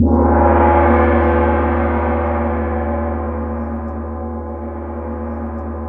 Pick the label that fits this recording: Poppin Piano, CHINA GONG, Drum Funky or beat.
CHINA GONG